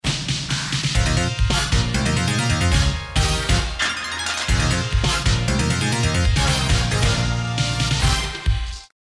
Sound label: start action; music kits custom